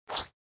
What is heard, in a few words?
Chomp Sound; sushi cat